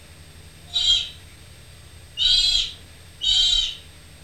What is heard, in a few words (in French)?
Sterne de dougall